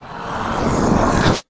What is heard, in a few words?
ghost; kult